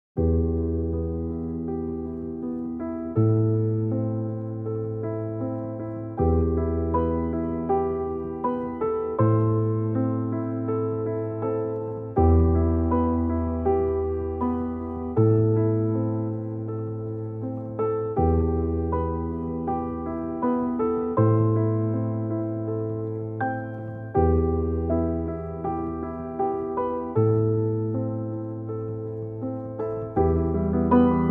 Piano Pianissimo, Chillout Piano Lounge, Classical Music Orchestra, Mellow